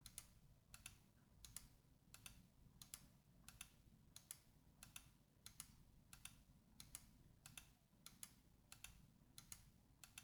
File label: logicool g502 x plus dpi change button sound